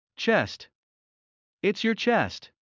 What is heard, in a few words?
Heart fast; Classroom humanbody